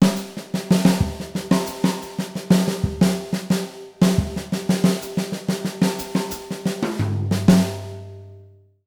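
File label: breakbeat, 120; Jazzistic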